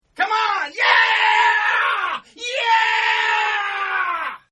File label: Yell; Partners In Rhyme